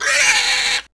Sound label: Pig Squeal; Civ4 warlords; Resouces and Improvements